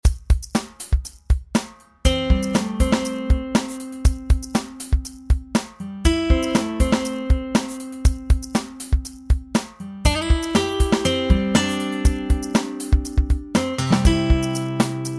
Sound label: Lively Acoustic